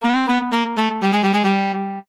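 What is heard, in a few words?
Jingle Lose; Yuru Yuru Gekijou Gekijouban 2 3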